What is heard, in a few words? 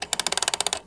alarm move